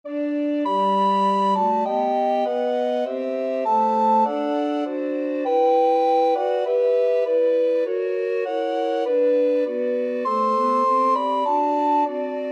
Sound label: i heard the bells, recorder quartet